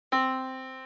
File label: yamaha Disk